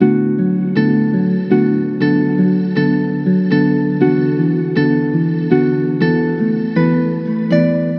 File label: Musical Loop 120 F Minor; Haze; Ghosthack